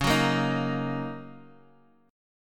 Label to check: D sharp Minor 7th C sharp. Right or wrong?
Right